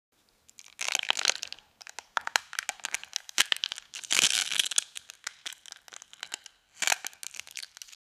rock candy smash; sweet

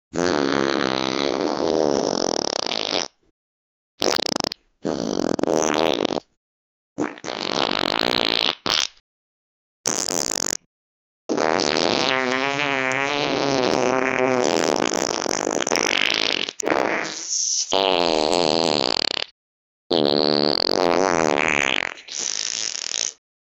male fart female fart; comedic

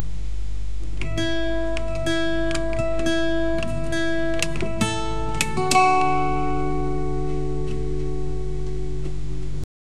warm italian acoustic gui; instruments

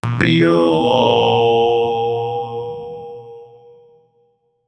an electronic and happy g, guitar

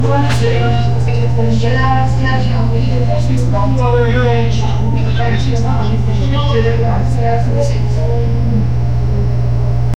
organic realistic calming

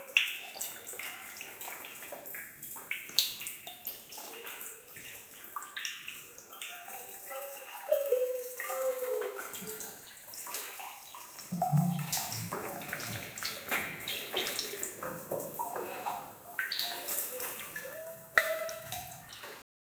sound of a person taking, bathroom

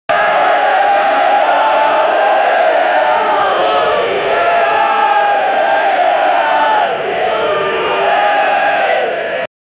a crowd chanting do or

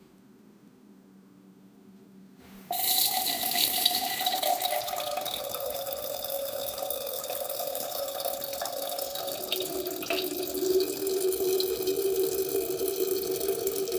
woman peeing in toilet st; bathroom

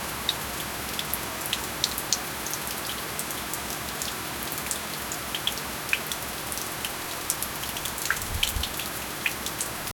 medium rain sound; nature

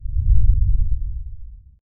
soft puff sound like a

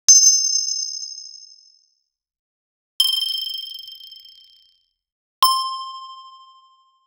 coin hit sound for casual

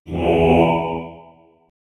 deep gothic mens choir, hard hit

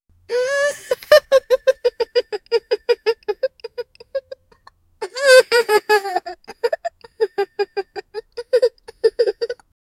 chill crying, emotional

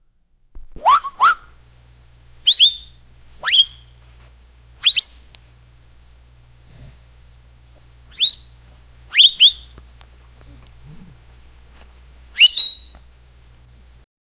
comedic donkey bray with; syllables

